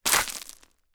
foley foot kick scrape gravel loose small stones single